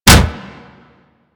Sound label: Kick Hit Default; Melee